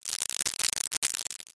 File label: applause